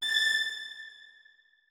notes, keyboard; Hideout Furniture

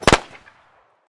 fuze f1, weapons rgd5; Blindside's Weapon Reanimation and Rebalance - Military

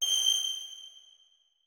notes, keyboard strings; Hideout Furniture

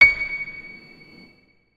notes, keyboard piano; Hideout Furniture

